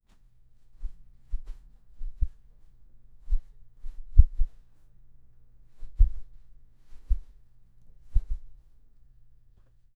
hands and arms gester; gesture

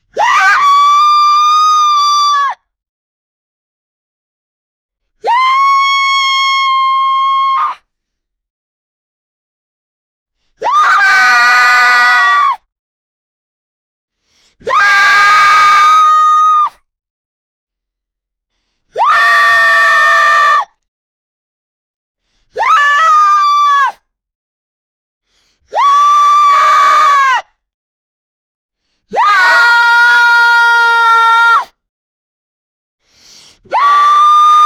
funny cartoon scream; comedy

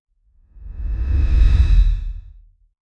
fast low woosh transition

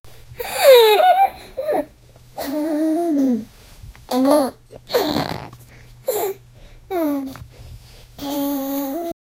girl gagging puking; vomit

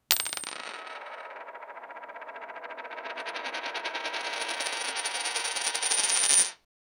the coin spins and rings; money

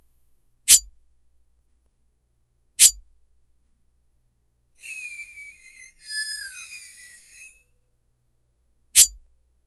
shing metal unsheath soun; fast motion

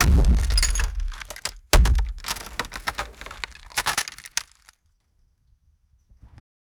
the huge wooden gates clo, gate closing

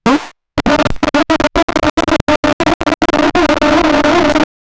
censored

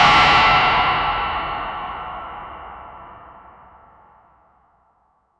distant echo like tone, misty

